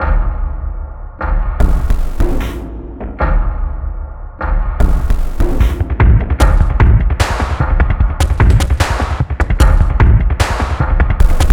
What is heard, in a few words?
Percussion Loop